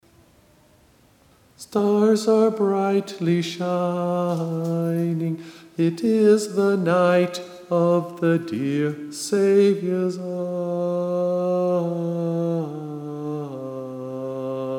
Bass II

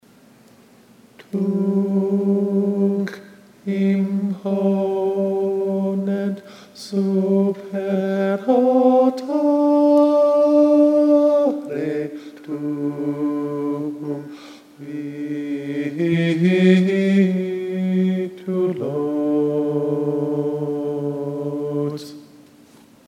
last page tenor; Allegri last page chorus Tenor; Lent/Holy Week 2013 Rehearsal recordings

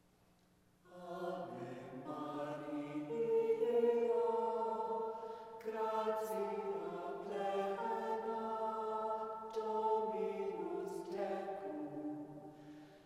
Ave Maria chant

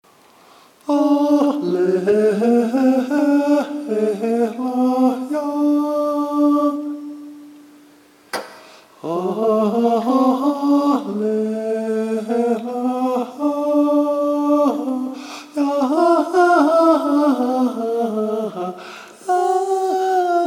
Tenor; Dic nobis Maria

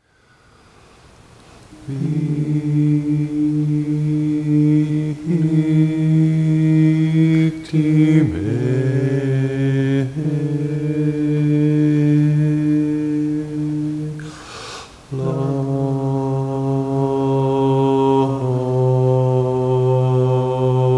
Bass; Victimae; Bass 1; Lent/Holy Week 2013 Rehearsal recordings

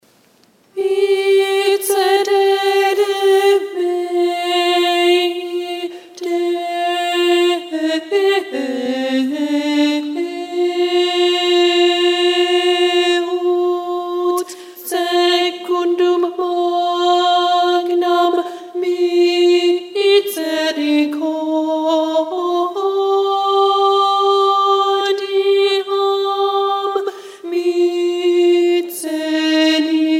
Allegri Miserere Alto; Allegri first page Alto; Lent/Holy Week 2013 Rehearsal recordings

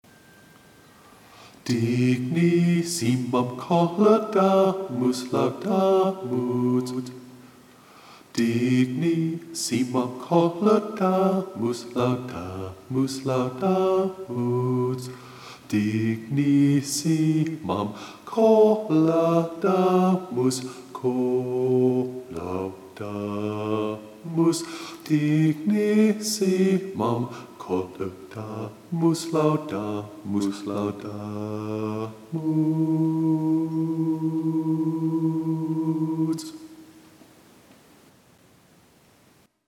Bass; Aufer a nobis pt 2

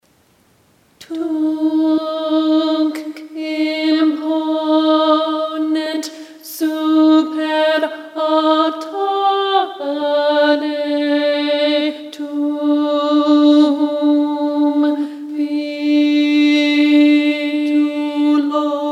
alto; Allegri Miserere; Allegri last page chorus Alto; Lent/Holy Week 2013 Rehearsal recordings

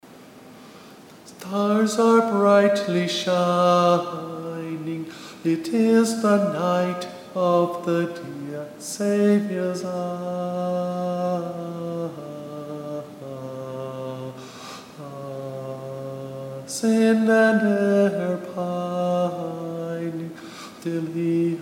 Bass

